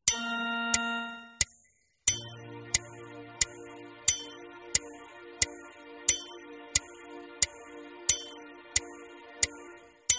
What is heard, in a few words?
warm up exercises